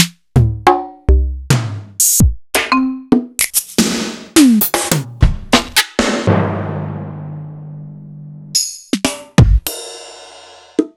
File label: EMU Proteus-2000; m8